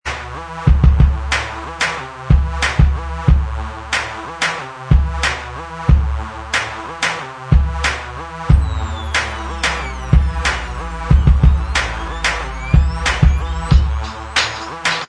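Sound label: instrumental track, backing tracks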